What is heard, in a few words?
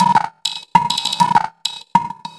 Psychic Beat; Sonic Foundry (Sony Creative Software); Loop Noir; Session 08 - Psychic Investigator